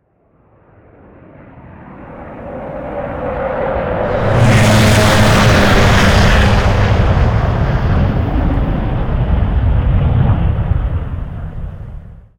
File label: strogg flyer by, vehicles; mono; Quake 4 Alpha